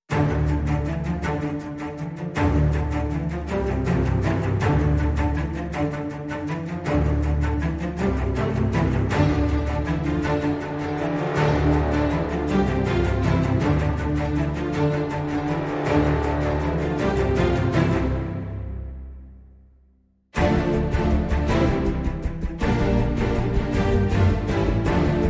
Full With Small Perc

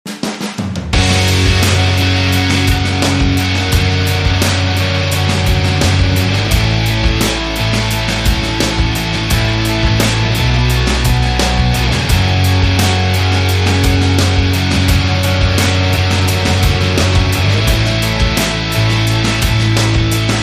Underscore

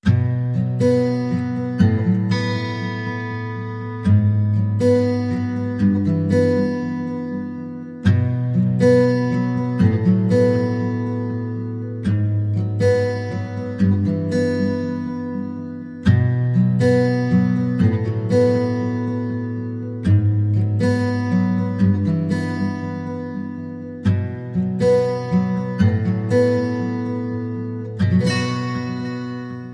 Guitar Only

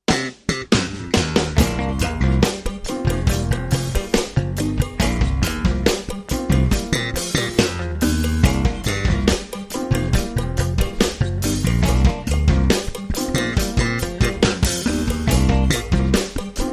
No Melody

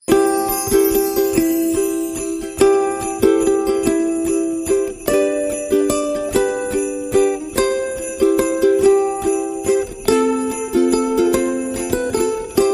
Silent Night Ukulele

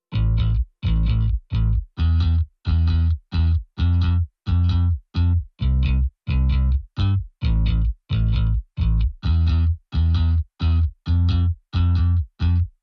Drums and Bass Only

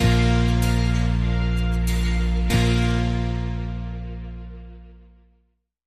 Bumper

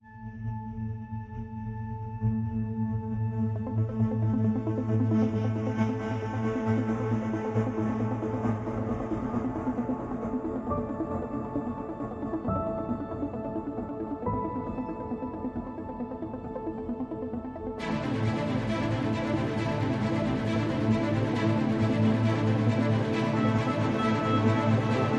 No Drums and SFX

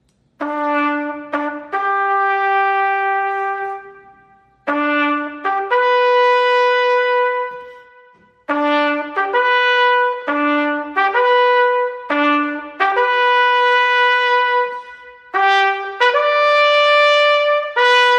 Taps; Trad (PD)

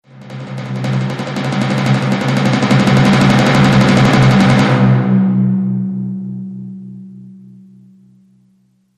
Timpani Roll

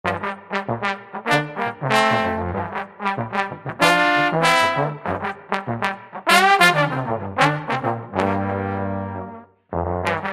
Bavarian Funk, Brass Only

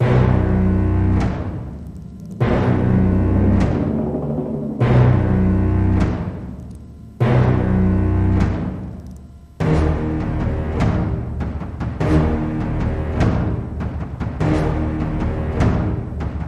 Drumwar

